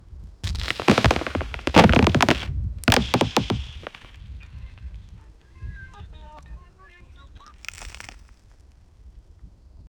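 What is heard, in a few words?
loud cracking of wood tree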